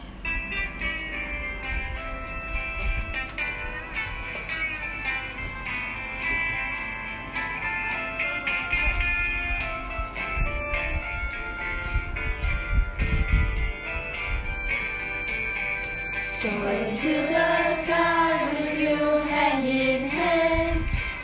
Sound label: Graduation SONG SINGING